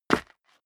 step leather shoes concrete grit single stair up; schritte

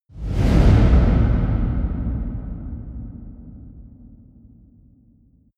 whoosh soft; swooshes-swishes

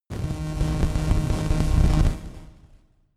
static crackle noise short, sciene fiction

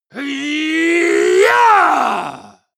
male combat attack long

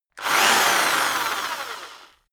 rock drill rev medium medium; maschinen; bohrer

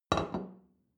plate place table soft short, gastronomie; soundpool

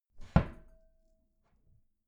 wooden door close regular glass clang

